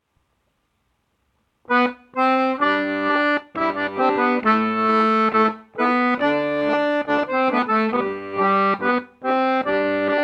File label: Scottish session